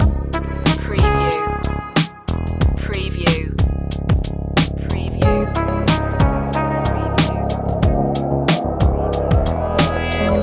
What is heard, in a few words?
jazz loop